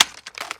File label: reload empty fast mag out; gmod; viper